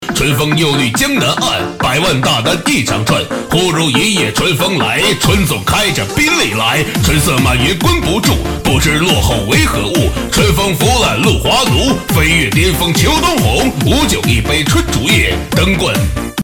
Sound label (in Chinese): 喊麦